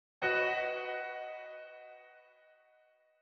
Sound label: Town Intro Piano; backpack hero gamerip 2022